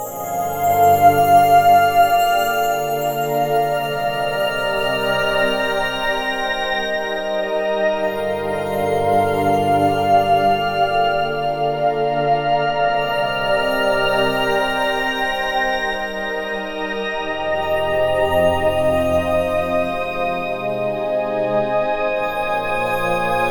Dreamy Contemplation, fantasy

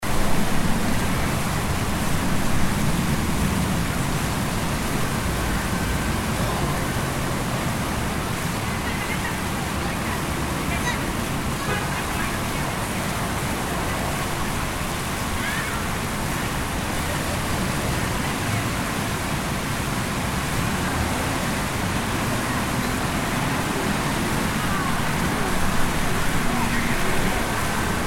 City Park Ambience

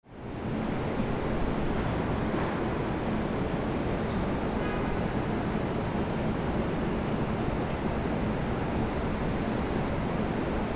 Street

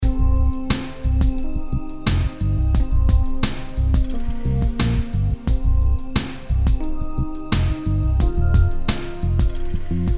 Jewel Thieves Looping

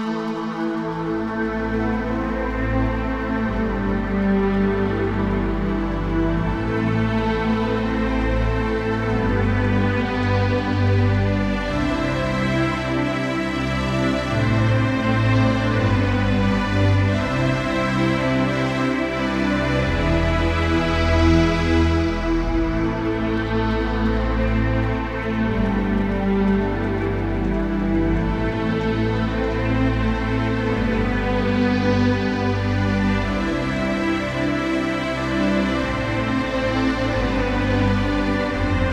RPG Intro, Looping, fantasy

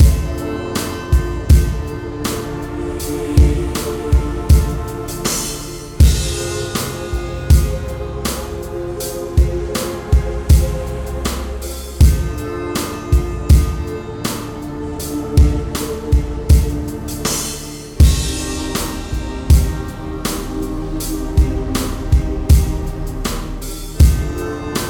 horror

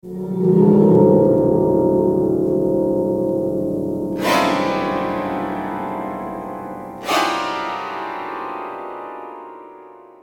Creepy Piano

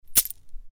Coins

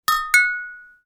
UI Quirky

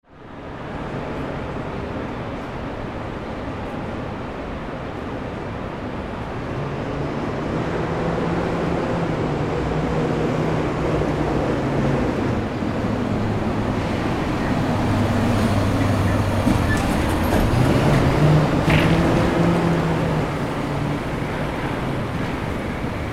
Street

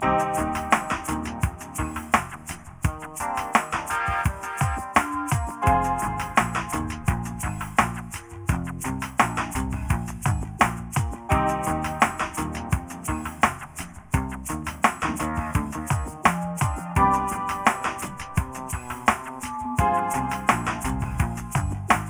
Corporate Espionage LoFi; Corporate Espionage 2

